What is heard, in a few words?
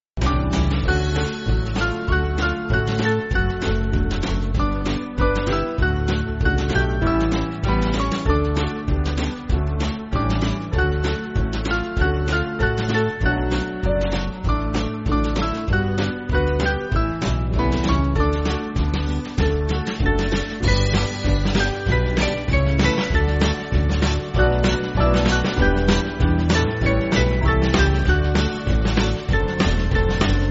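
Band, Music Backing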